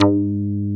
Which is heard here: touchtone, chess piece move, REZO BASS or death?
REZO BASS